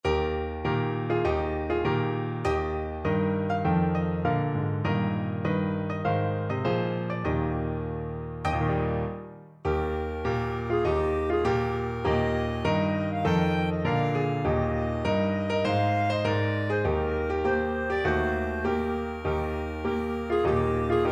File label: e rere taku poi clarinet